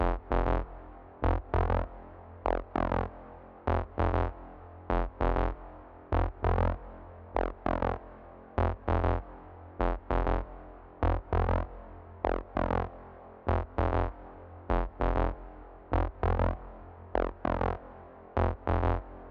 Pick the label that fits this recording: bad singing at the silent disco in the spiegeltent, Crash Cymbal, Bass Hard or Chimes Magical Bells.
Bass Hard